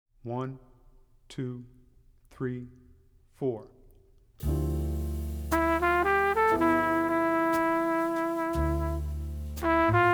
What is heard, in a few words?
Bird's Ballad (With Alto); jazz; intermediate jazz conception